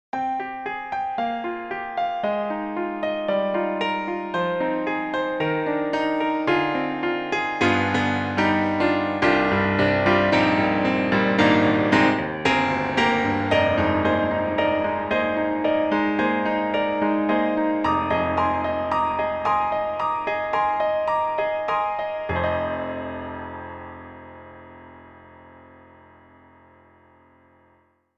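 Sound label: Rokkin' piano; Roland Juno-Gi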